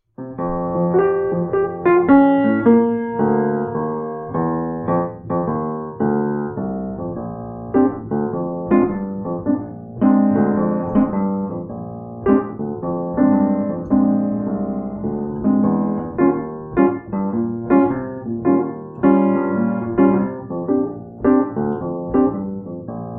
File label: Sax Frankie and Johnny piano